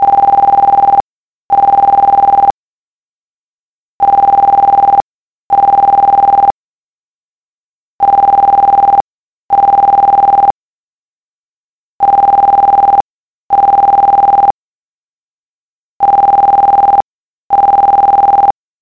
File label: Mobile Ring